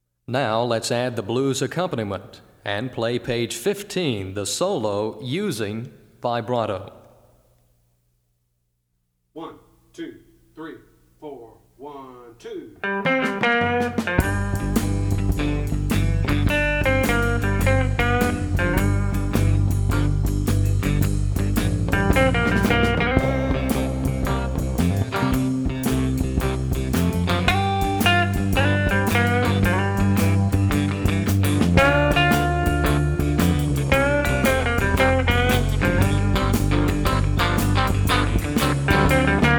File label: Vibrato, Blues Lead Guitar; Blues Lead Guitar Method; Mel Bay Publications, Inc.